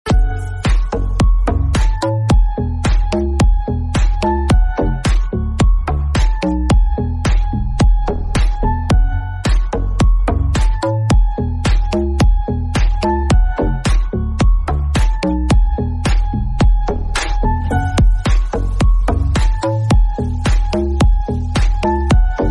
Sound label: rock remix ringtone